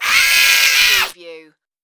Alien screams

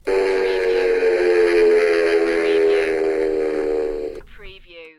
Cartoon Plane

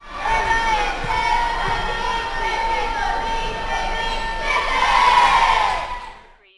Basketball Cheerleaders Chanting

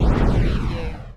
Plasma Ray Cannon

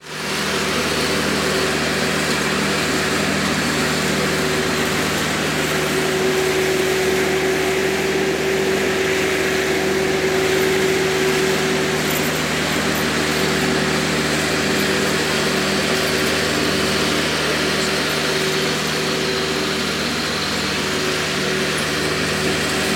Motorized Lawn Mower

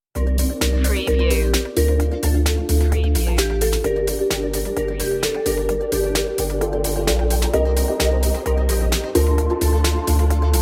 emotional dance

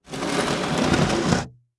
Plywood Slide Scrape

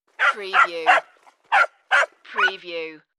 Yppy Dog

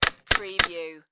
Electric Staple Gun; Sound Effects Bible

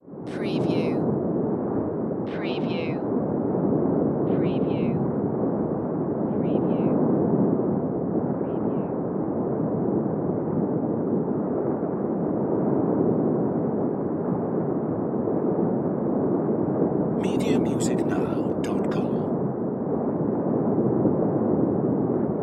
Underwater Ambience

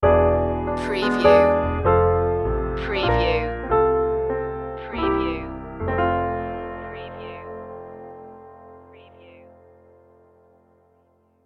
gospel piano sting

